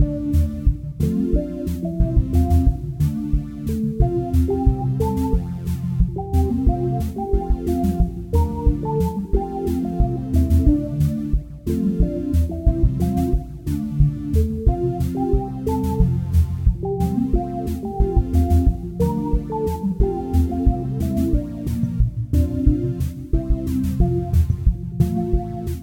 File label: menu music; ASCIIpOrtal